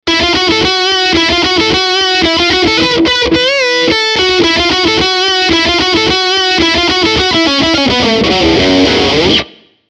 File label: Phantom Stratus